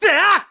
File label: Sword Shoot; Link; zelda sounds; Skyward Sword